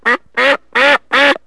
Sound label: DUCK; animal fun